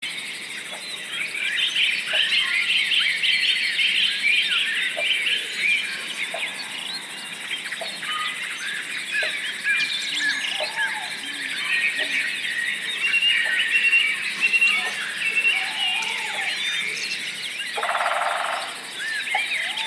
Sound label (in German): Waldklang; Huuri